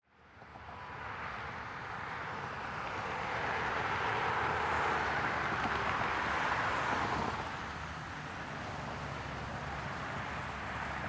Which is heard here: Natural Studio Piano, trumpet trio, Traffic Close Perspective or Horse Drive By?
Traffic Close Perspective